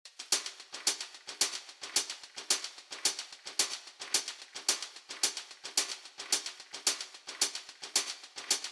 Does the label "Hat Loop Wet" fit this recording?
Yes